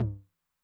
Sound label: Mid Tom Noise, 909; classic machines; MT - MID TOM; 909mod